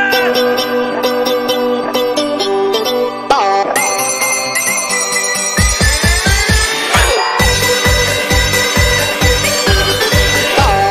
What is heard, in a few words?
JINGLE BELLS RINGTONE